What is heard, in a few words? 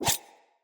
dagger whoosh heavy; Sinecure Dagger